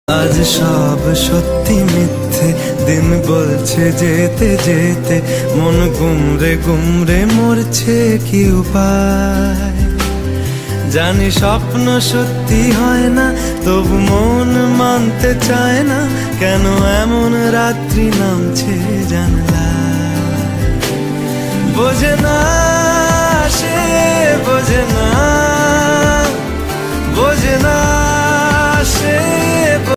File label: bengali ringtone